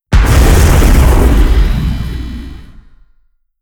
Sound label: titan laser fire; weapons; Stellaris